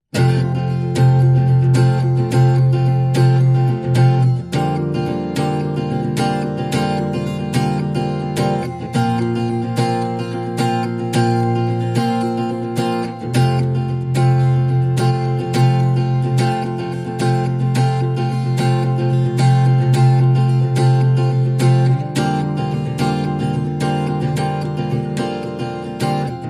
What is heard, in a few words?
Solo